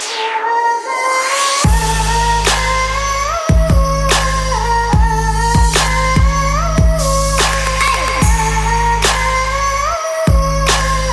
Trap

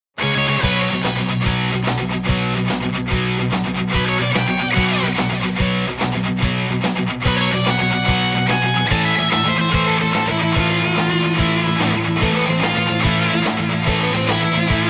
Rock Ringtone

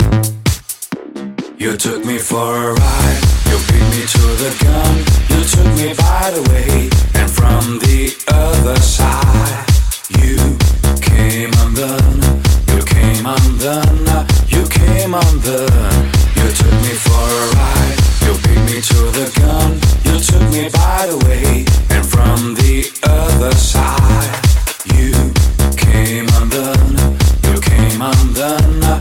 MusicBox; Jellyfish

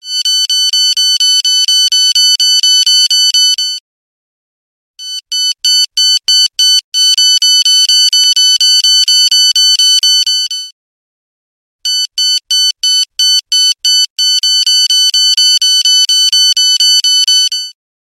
Morning Alarm

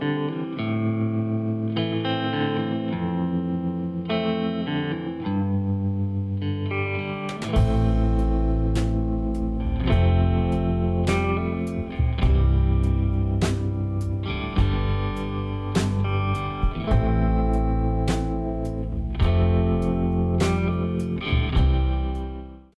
Country Sad Guitar

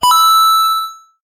Coin Obtained; SMB3

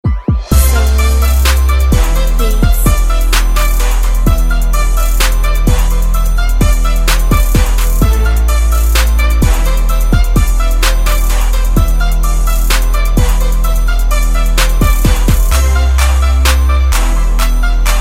bass beat

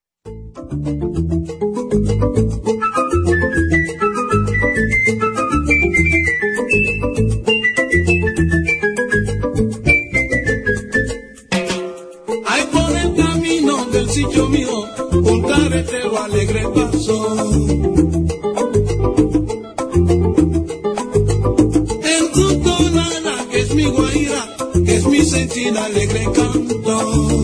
salsa

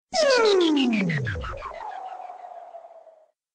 cosmic sound, ringtones

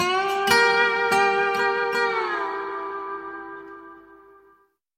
Dobro Lick

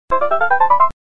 fantastic casio; ringtones; latino

